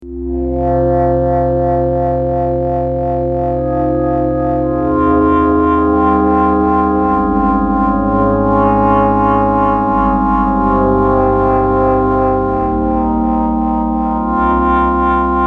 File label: esq1